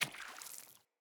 Sandals Run Water, Footsteps; Player

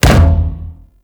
Breakout Hit One; Amalgam Carrus Pilot

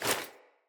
Foley Action Default Punch, Default Clothing; Player

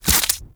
Arch Gun Primary Beam Impact Flesh; Warframe; Weapons; Archwing; Hildryn

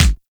Weapon Punch Shotgun; Warframe; Weapons

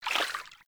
Vial Foley; Warframe; Warframes; Alchemist

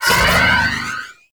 Pump Shotgun Alt Proj By; Weapons; Warframe; Zariman

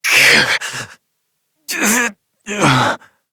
Death, Adult; New War; Operator

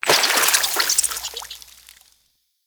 Infested Flower Burst; Warframe; Ambience; NineteenNinetyNine

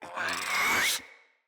Infested Charger Run Breath

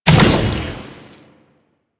Proto Infested Ancient Tentacle Proj Die; Abilities

